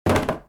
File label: Box Impact